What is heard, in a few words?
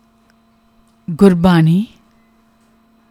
Gurbani; ardas